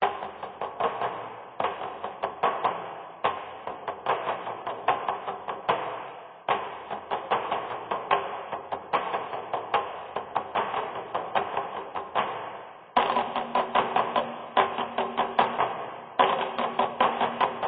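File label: Music Ambient; Saints Row 2; PS3 Windows Xbox 360 gamerip 2008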